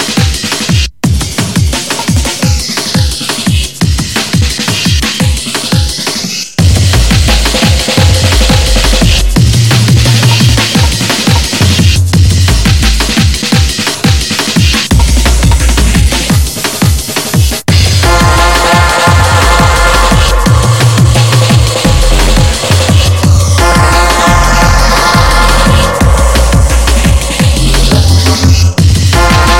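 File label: digital drum and bass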